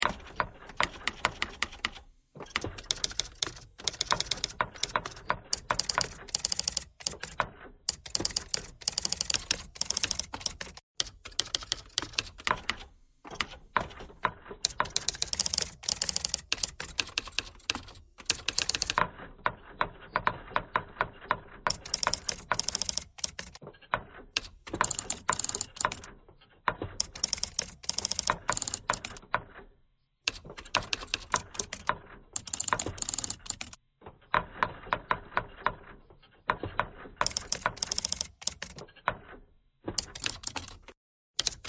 typewriter thread pentatonic

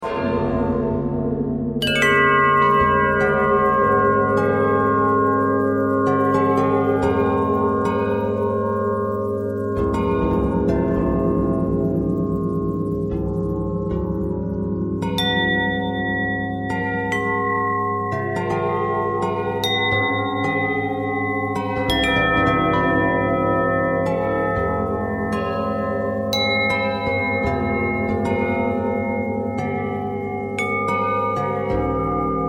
bell twist

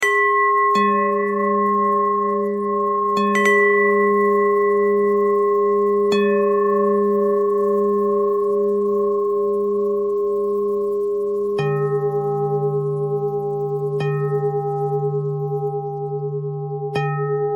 bell bell thread noise

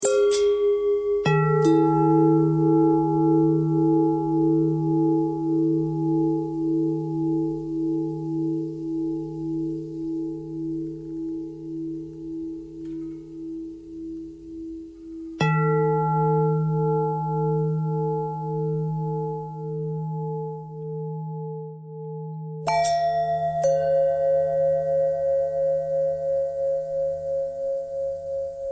long bell bend threads

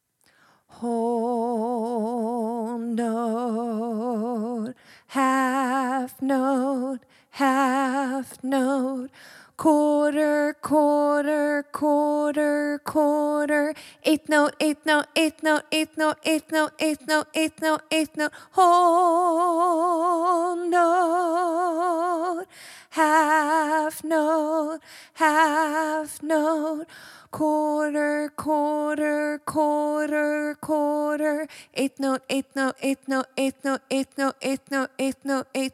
tapping note values

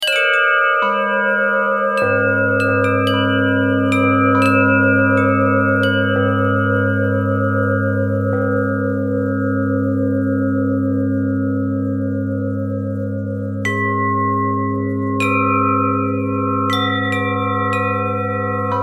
bell harmonic twist, bells

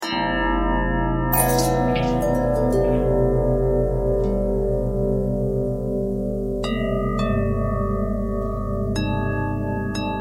long bell bell harmonic twist, bells

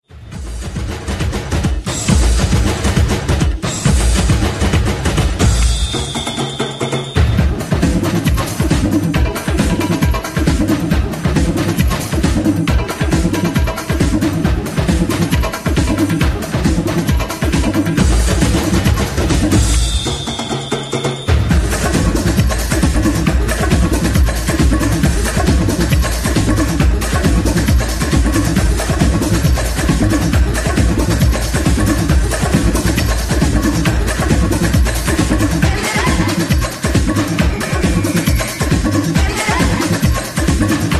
Batucada

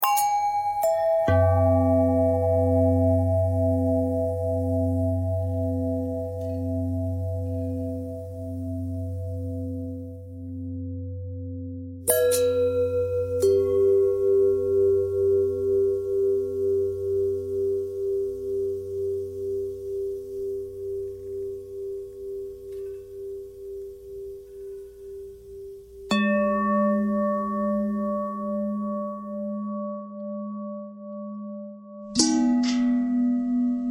bell long bell thread pentatonic

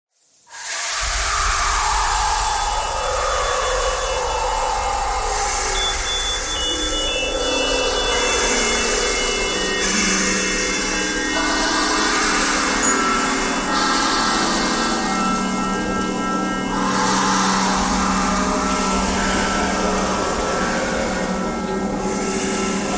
breathing thread noise

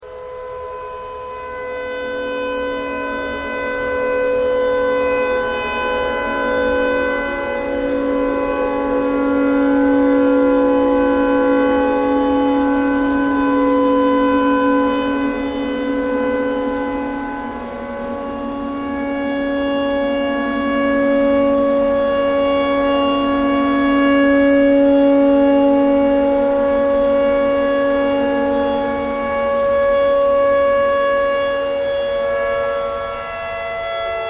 tornado siren tornado siren thread noise

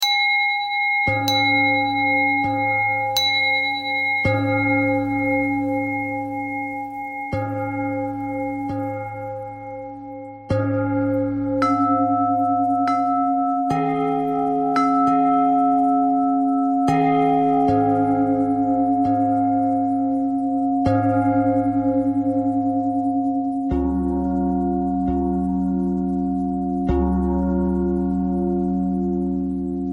bell bell thread simple, bells

